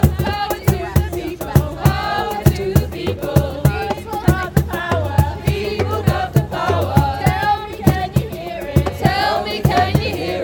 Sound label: chant; XR; Power to the people